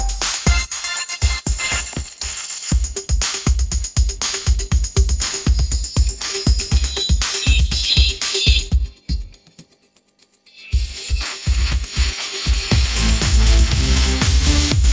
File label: pop groove